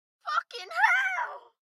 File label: town human road; Blind Drive